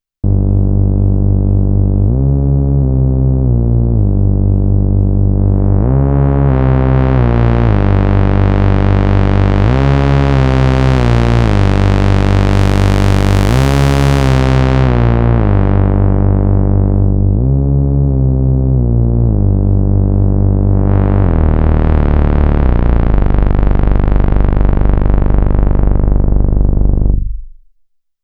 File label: PWM Bass